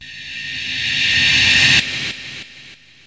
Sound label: approach echo; Weird and Interesting Hits